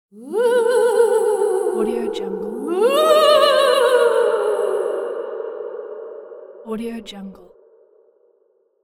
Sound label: scary female ghost haunt